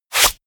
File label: wipe